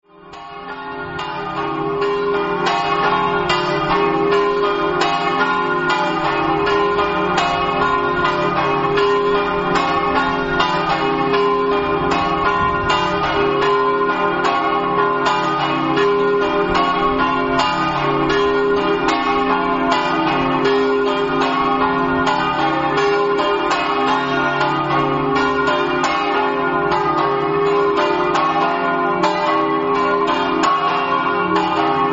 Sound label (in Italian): povoletto, campane